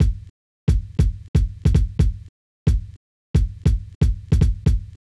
90 Beat D Heavy Kick

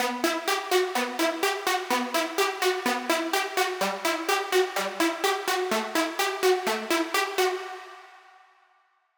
Melody Loop G A B E F 126BPM; Future Raise

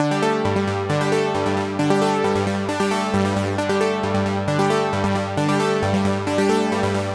ARP 134 G

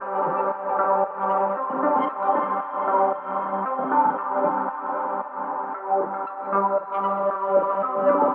Stab side 115 G Wet

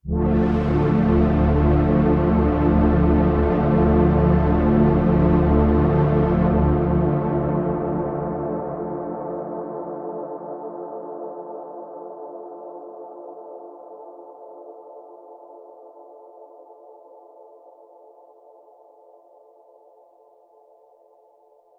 Pad Filter Chorus space cloud; Bricasti

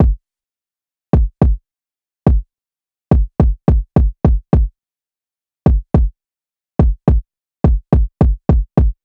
106 loop drums kick; vibez

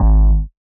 BASS, G

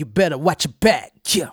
Lead Vocal Dm

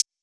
shishy hat

